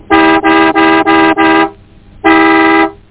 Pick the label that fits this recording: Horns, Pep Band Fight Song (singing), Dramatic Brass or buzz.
Horns